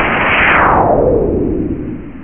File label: Spell Launch; Realmz